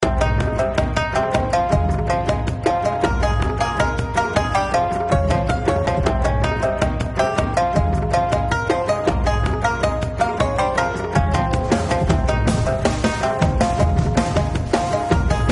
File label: BOSS FIGHT BGM; Fly Fu; iOS, PSP